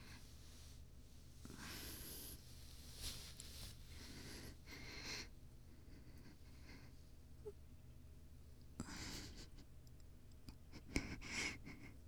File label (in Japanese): バイノーラル喘ぎ声, ASMR